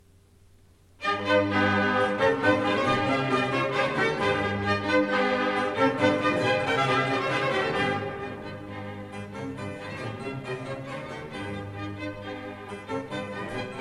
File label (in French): tambour; marais; semele suite d orchestre